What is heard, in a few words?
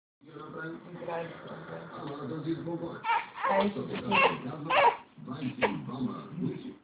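baby hungry, male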